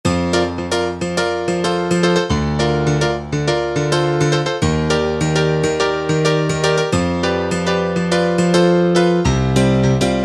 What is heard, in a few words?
Piano 8', Korg M1; Factory preset examples